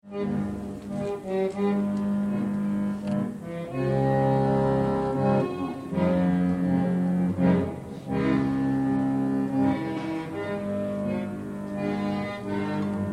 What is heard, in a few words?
Accordion player busker with quick exit, exterior; Accordion player busker with quick exit - exterior cafe courtyard